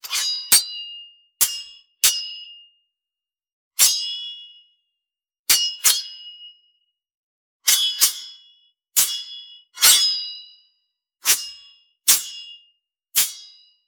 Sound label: futuristic sword swing